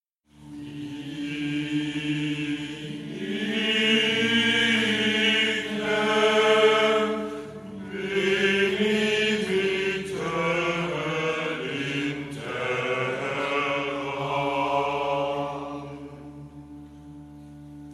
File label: choral; demaskierungen; Choral Ignem